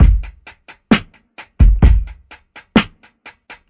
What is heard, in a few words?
rauch, Drum loops Boombap